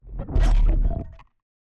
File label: particles impact marble collapsing; sonniss gdc